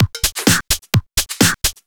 Straight Loop 128 BPM, House

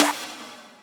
Percussions

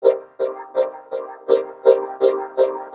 MECHANIXXX, Synth FX

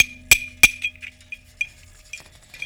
Bell + Wood, Percussion Loops; Ambient Grooves

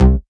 Basses Root C

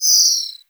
CAR, CARTOONY; JETSON CAR; Spectrasonic Distorted Reality 1

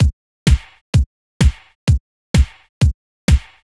kick snare; Sonic Foundry (Sony Creative Software); Afterhours EDM; Retro Fit

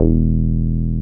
303 SQR E2 P, SQUARE BS

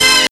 HIT, STABS, House

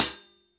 HH CL, Drum 1 Dry; East Collexion

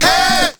VOCALS; Ueberschall; Jam Box

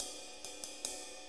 6-8 Ride, HiHat & Ride Loops

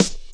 SD, Drum & Bass, 150 BPM

